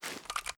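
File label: assault rifle extended mag reload fast rotate; gmod; viper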